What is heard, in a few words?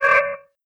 steel bar door open; minetest game; xpanes